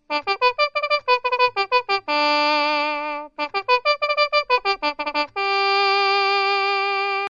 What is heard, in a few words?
trumpet